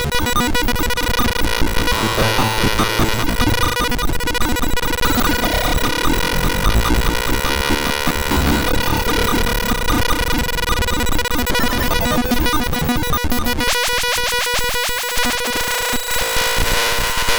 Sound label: SAA1099P variable clock